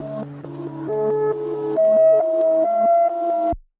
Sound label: melody